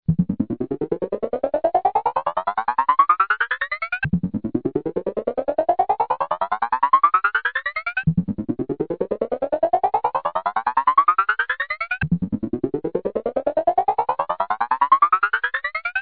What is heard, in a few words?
synth